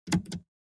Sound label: Press on Bet